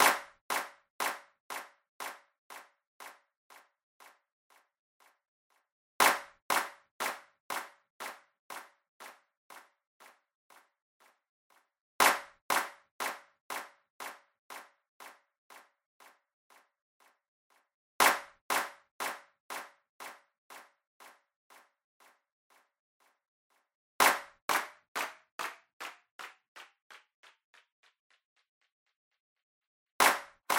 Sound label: timeless pingpong